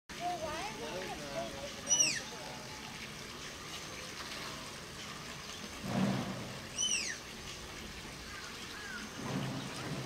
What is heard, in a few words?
Malayan tapir; تاپیر مالایی